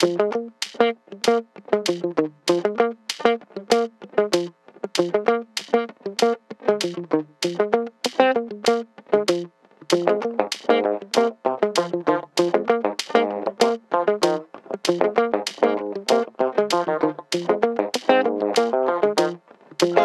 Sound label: ringtone strummin; The Light Phone II